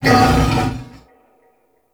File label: Wolf Attack; Animals; Duviri